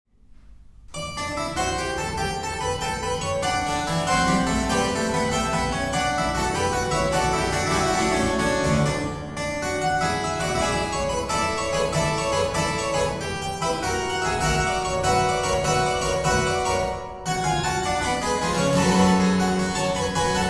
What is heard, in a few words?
Keyboard Sonata in E Major, Vivo